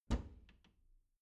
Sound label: bathroom cabinet doors open soft; objekte; tueren